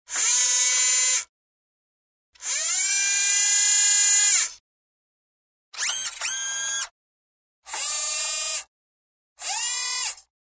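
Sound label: robot sound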